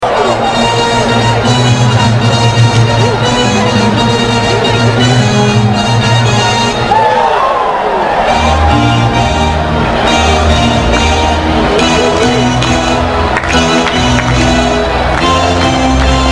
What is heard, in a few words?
ORGAN Tsatra ta tada tiduum; OLYMPIC